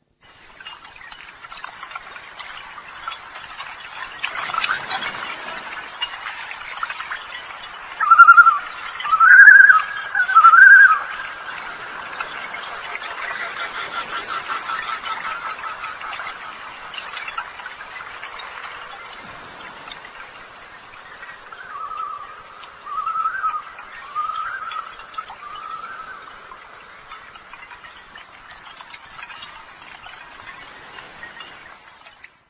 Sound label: loon